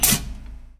deadman, Train, R62 Kawasaki; OpenBVE

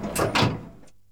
Off and release, Train; OpenBVE

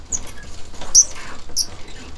Blind Wind, Train; OpenBVE; Cl313 Silverlink

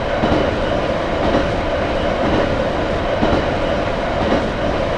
Run, train; OpenBVE

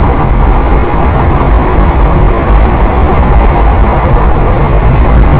Run, Train; OpenBVE

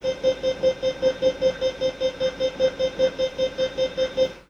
Klaxon, Train; OpenBVE

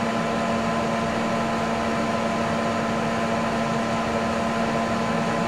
ac loop, Train; OpenBVE

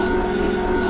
Motor, Train; RBDe560

